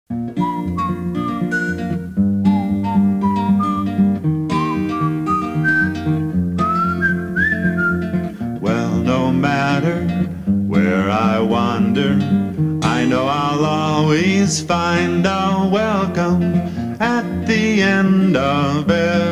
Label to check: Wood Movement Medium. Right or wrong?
Wrong